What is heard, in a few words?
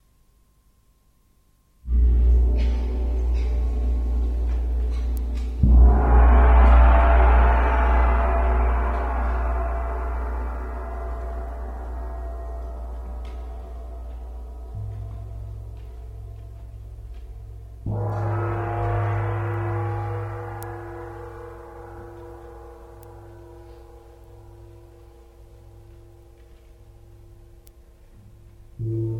Gongs